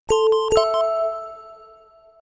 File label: Space Whispers Notification; ZTE Nubia Red Magic 8 Pro Plus